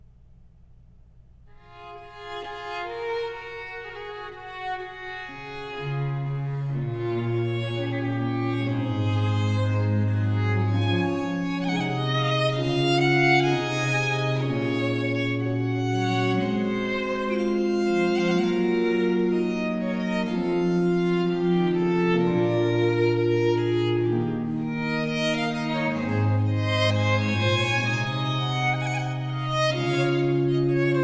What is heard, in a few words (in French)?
Pièces de violes, Suite in D Major; Book 4, No. 43-46; Nicola Matteis; Ayres for the Violin; Quatrième Livre (Paris 1717)